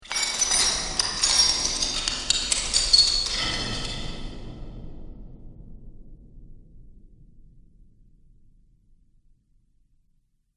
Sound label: Dungeon Chains And Concrete Slab; Chains Sound Effect - Clink, Clatter, Clang, And Clink Are The Sounds That These Chains Make; Evil Sound Effects